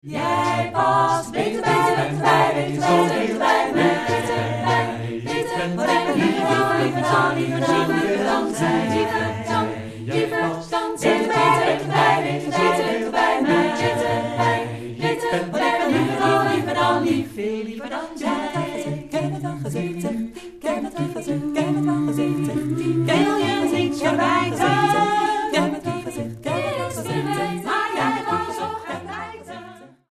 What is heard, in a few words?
ssatb